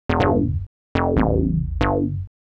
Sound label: SNTH BASS ELCTR 140 A, Techno